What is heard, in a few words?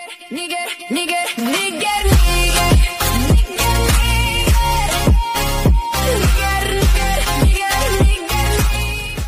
phonk